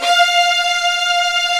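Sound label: violins, fff; String Master Series; Vlns 8 fff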